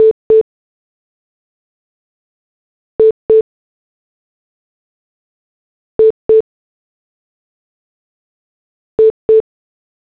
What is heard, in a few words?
hold dual 440 multi